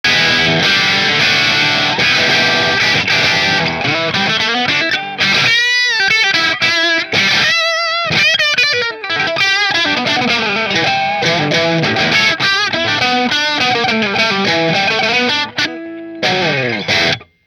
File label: black back bridge marshall, McNaught Custom Guitars Vintage Single Cut Black Back